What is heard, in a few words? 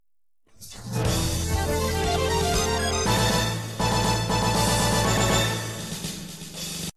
arcade win sound